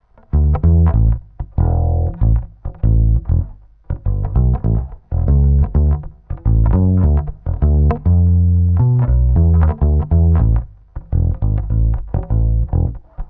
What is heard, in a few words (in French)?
doigt, Bow Precision Bass